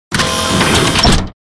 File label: Robotic Movement; SoundsCrate